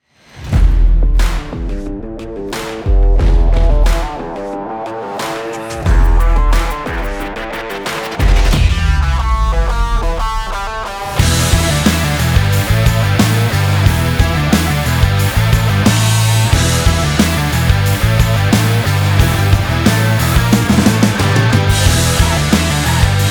Powerful Sport Rock Trailer

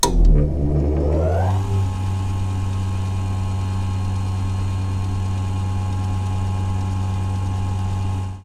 centrifuge

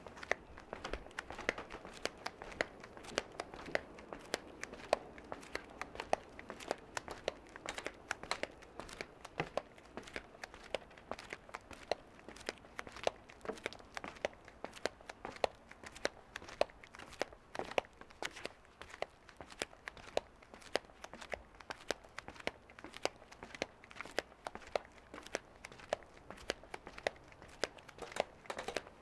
Walking with slippers on the ship

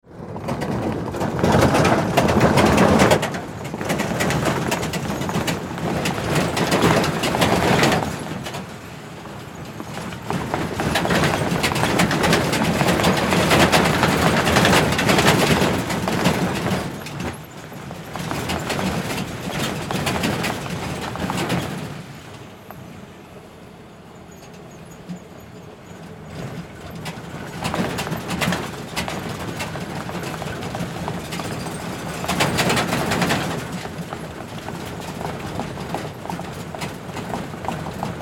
The truck is moving

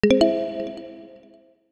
notification mobile

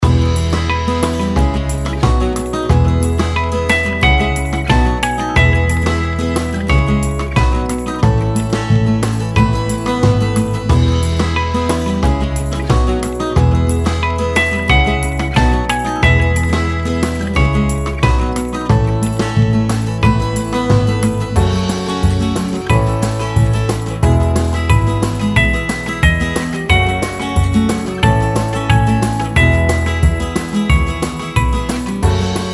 Loop, موزیک پس زمینه

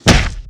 A hard blow to the face

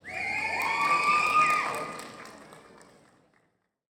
Cheering, whistling and screaming

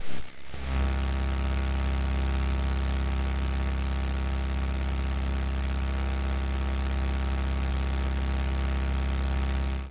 AM Radio Static Broadcast

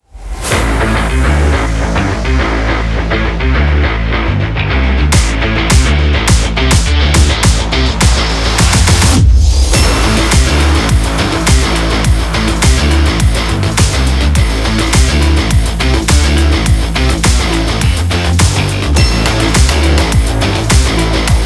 Agressive Sport, موزیک پس زمینه

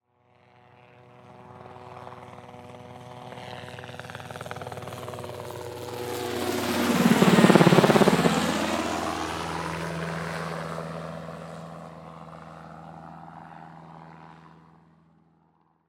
helicopter; افکت صوتی

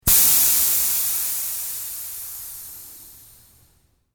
Tire deflation

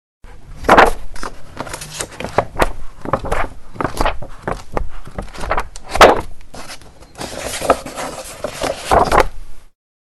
Turning the pages of a big book; افکت صوتی

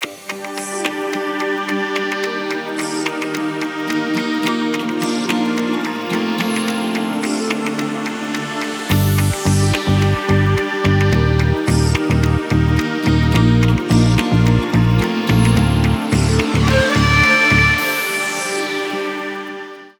mysterious ident

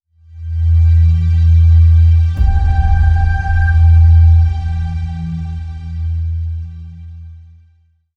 dark logo

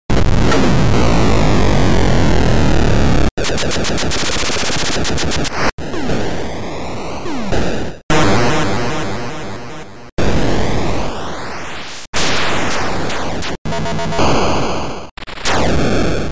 Atari; افکت صوتی

